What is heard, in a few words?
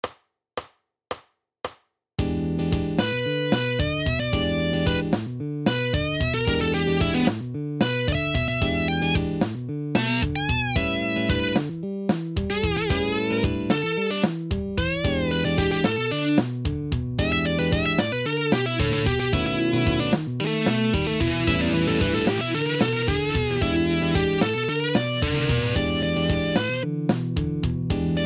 Style Solo